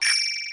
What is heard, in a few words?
ring; Ed Edd n Eddy - Adromulus Pack